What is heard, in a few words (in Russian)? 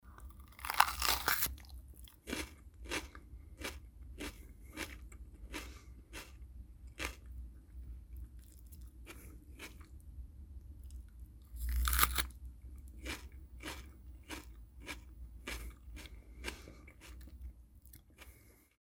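2 варианта болгарский перец; Звуки откусывания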